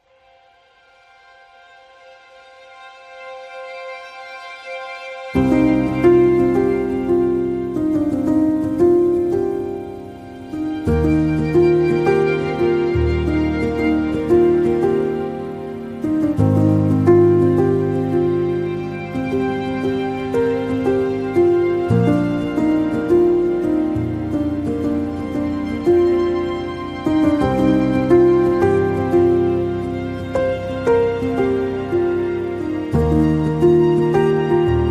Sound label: No Perc